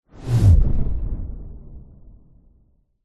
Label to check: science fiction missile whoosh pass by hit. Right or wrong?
Right